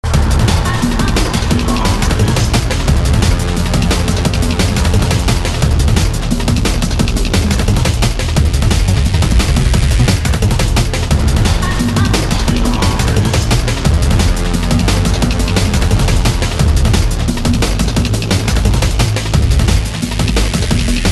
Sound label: remix